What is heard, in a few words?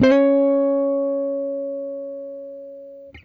JAZ OCT SLUR, guitar; Quantum Leap - Guitar & Bass